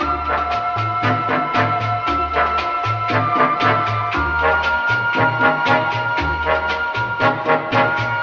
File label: horns and flute, phonky loops